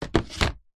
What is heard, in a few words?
tub plastic lid set down on tub; foley